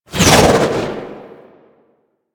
Archgun Projectile By; Warframe; Archwing

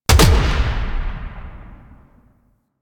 Sniper Punch; DE; Warframe; Frumentarius; Exalted Sniper

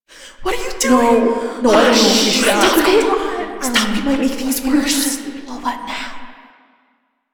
Destroyed Class Student Ruckus; Warframe; The New War; Zariman

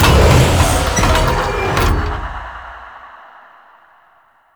Elevator Open; Warframe; Tau; Circles Of Hell

